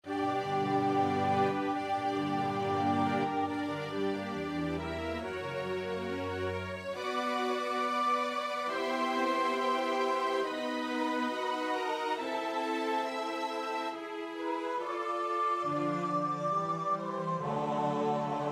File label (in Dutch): tenor; Groot Koor; Elgar (Edward); The dream of Gerontius; Lord, Thou hast been our refuge(tenor 1)